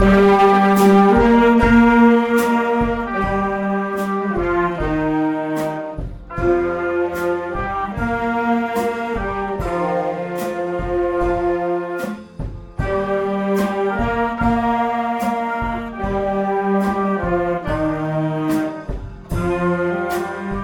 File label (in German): bigband sinfonie aus der neuen welt